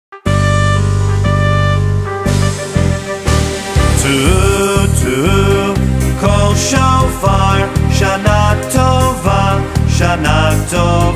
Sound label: Shofar